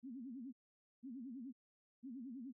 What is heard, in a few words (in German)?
frequenz 250; hoertest